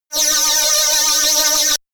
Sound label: shot annoying buzzing tooth, zaps buzzes